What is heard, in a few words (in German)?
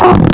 beschleuniger; Jump Zampoli